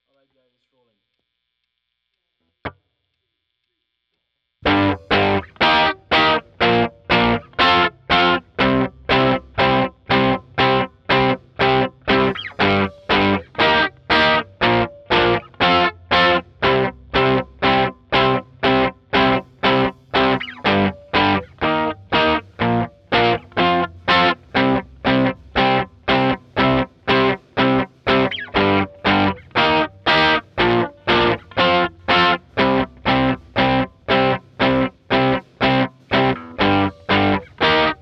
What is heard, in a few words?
Gtr